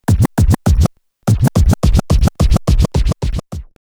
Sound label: baby, scratch